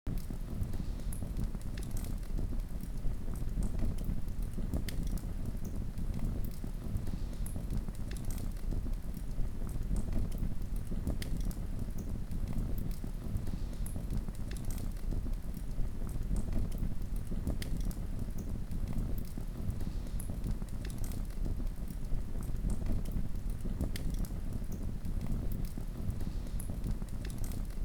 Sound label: Torch Ambience Loop